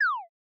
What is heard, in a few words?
Unpause; leohpaz; retro rpg menu sounds